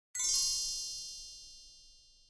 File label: card